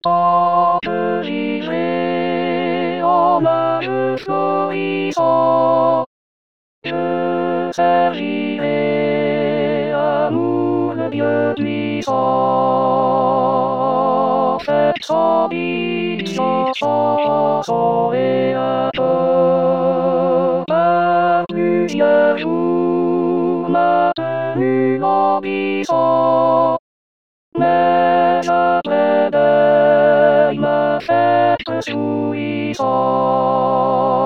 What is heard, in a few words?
alto; rimailleurs